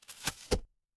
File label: impact bow